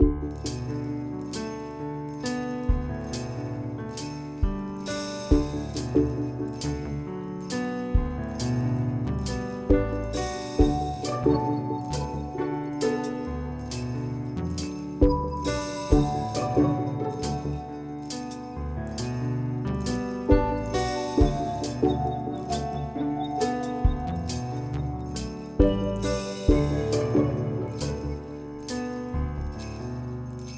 Social Hub Ambient Low

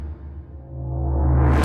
Mus Tsunami 145 Light; from dust

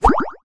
Pullout 9 ball

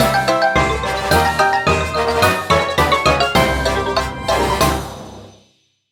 Test Jingle; Yawarakaou

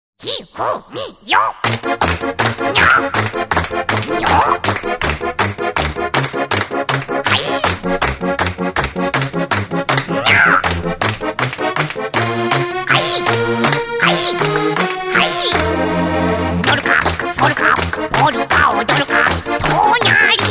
polka-o-dolka (tango mix)

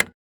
Click down; android; Does Not Commute

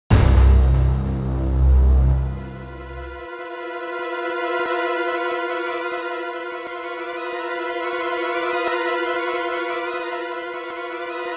Environment Sound (Shock); Metroid Prime and Fusion

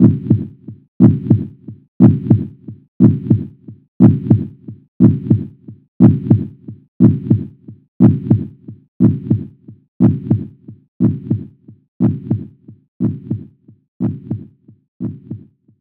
Heartbeat

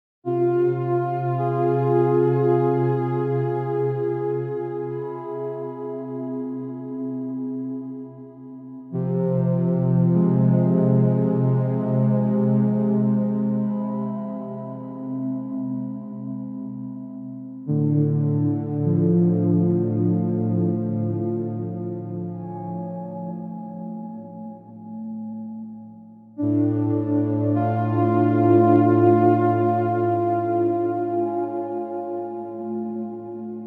Day Stinger; dying light the following gamerip 2016

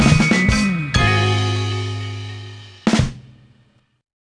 Mini Sting Success; bully scholarship edition

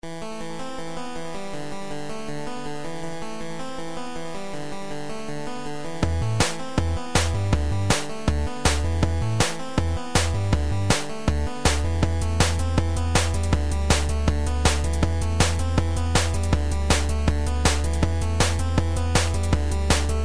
Dungeon

